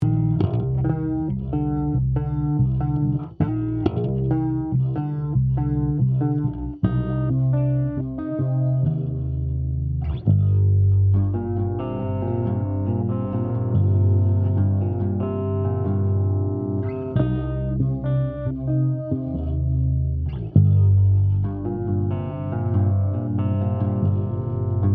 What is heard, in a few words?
Guitar